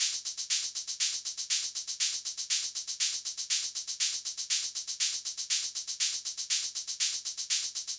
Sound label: hihat